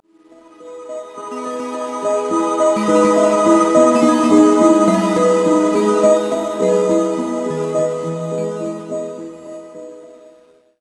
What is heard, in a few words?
melodies; sona; paxsona